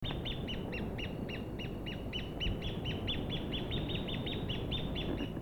tockus alboterminatus, birds calls; bucerotidae

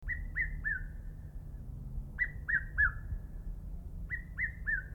cuculus solitarius, birds calls; cuculidae

